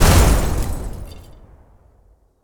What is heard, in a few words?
explosion glass debris